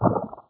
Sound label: eyeball